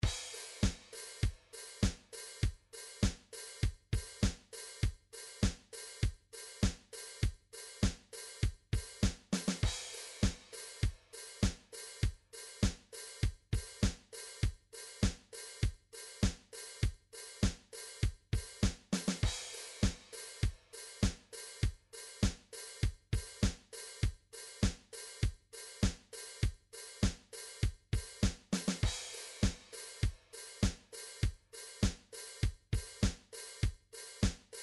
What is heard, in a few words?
midi drum patterns drums disco